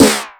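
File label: snare processed, Disco House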